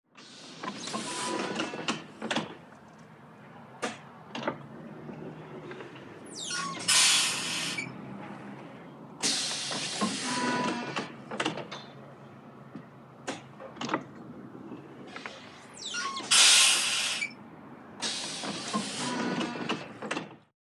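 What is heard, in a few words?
bus doors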